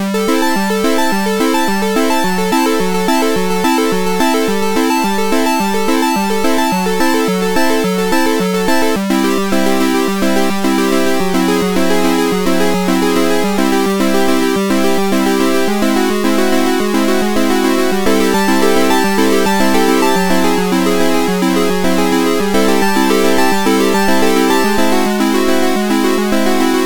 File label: chiptune, nocturne